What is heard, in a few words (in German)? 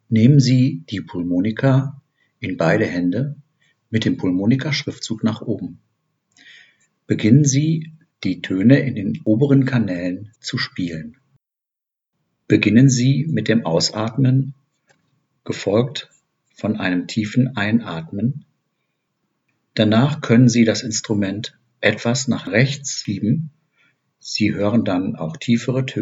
pulmonica Übung